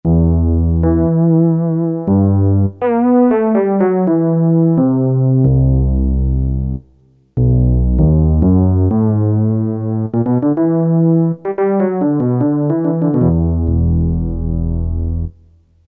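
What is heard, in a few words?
bass fretless; E-mu Proteus FX; Factory Presets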